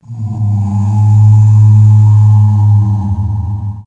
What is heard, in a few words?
Moaning Sounds